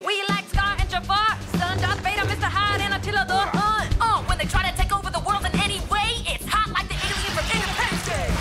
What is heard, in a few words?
Bad boy rap